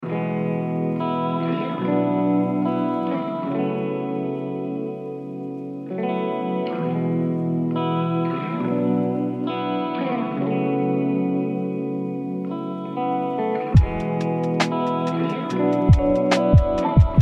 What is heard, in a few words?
Waves coming, Chill Instrumental Beats